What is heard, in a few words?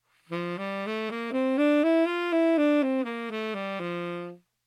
F major scale, saxophone